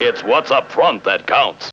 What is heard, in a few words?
VOX; Zero G; Man Machine